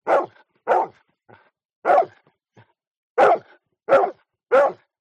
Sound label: animals dog bark med